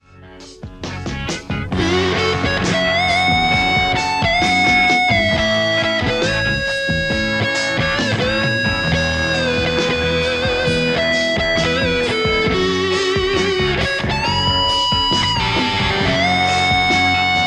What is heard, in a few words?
les paul; igniteamps theanvil v2 01